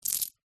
household jewelry necklace silver charms place into hand; zapsplat